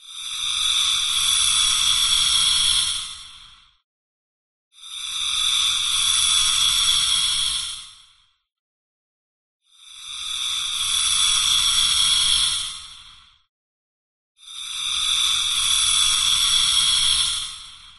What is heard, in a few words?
Astronaut Breathing